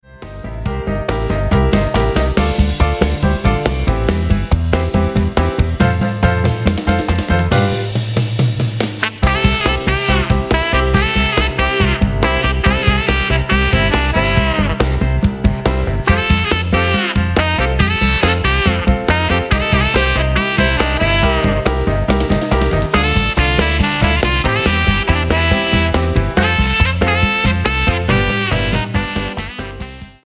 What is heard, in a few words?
Uplifting Backbeat